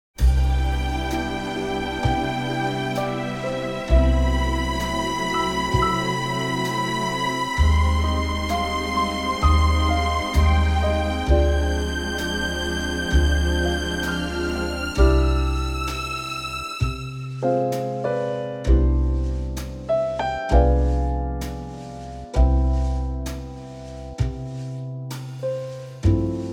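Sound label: orchestral C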